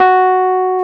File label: piano Rhodes